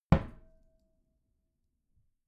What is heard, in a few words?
wooden door close soft glass clang